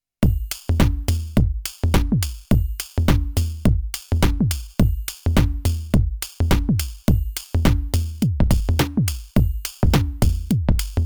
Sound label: Pattern Generator; Sonic Potions x Erica Synths LXR 02